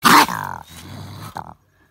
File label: raccoon snarl